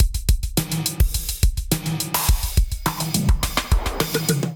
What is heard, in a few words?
Kit groove 105 bpm; MIDI Clips; Highway Kit groove; Drive and Glow; Ableton; Factory Packs